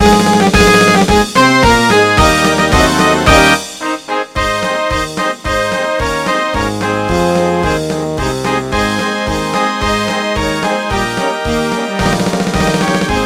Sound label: happy marching band